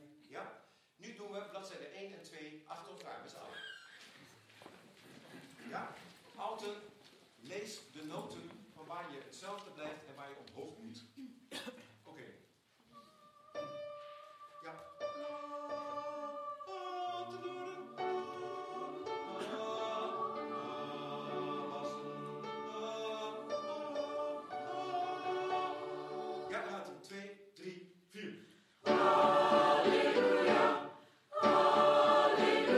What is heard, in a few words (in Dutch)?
Halleluja SATB samen zingen